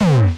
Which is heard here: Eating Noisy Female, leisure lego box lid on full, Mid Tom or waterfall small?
Mid Tom